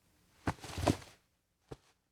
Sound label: hat take off